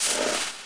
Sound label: wolf stand; phantom